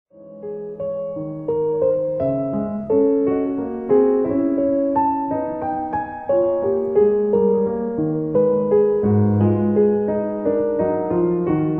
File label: Clavier, G sharp minor Fugue; The Well-Tempered Clavier